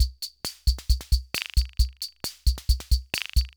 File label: Loop, sr-95; classic machines